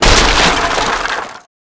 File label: toilet; World of Tanks Blitz; objects ordeal